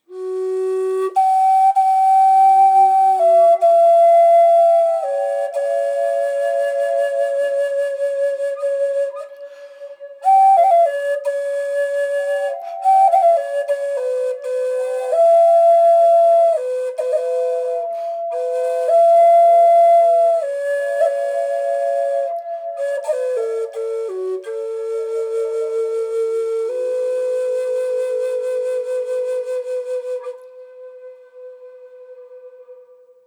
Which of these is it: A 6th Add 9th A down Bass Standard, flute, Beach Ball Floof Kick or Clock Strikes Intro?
flute